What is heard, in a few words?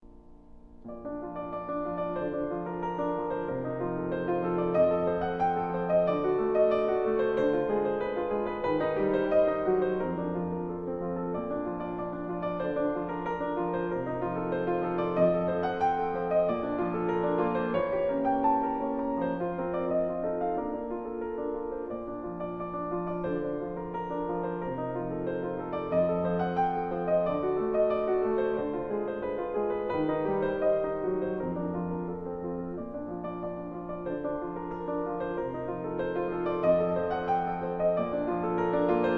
Schubert Impromptu B-flat